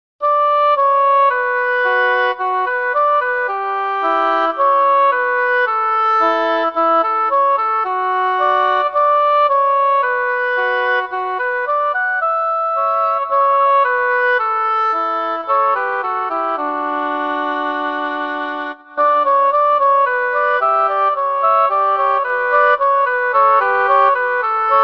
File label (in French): petit duo en re pour hautbois